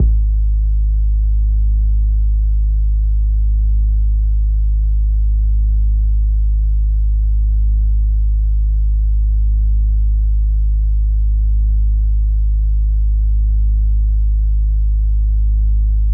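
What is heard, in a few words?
bass drum T8 Cont, Yamaha DJX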